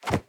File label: flip